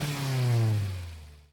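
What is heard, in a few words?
police engine rev attack rev down; Blind Drive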